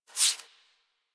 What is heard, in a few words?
foley idle lick furr; Enemies; Warframe; Catbrow